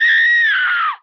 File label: female death; Emperor - Battle for Dune